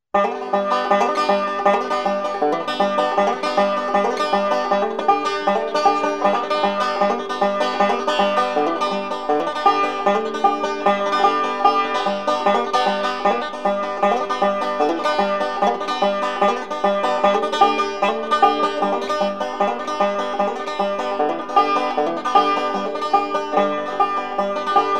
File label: they play the banjo